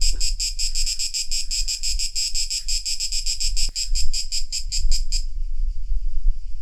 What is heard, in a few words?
crickets